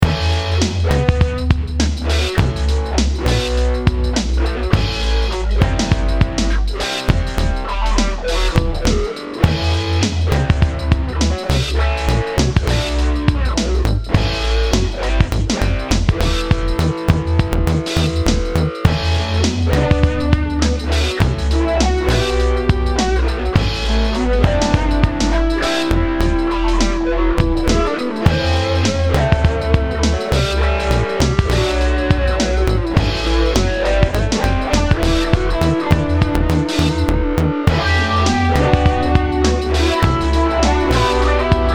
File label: Guitar